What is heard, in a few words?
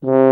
LO TROMBONE; East West; Global Sounds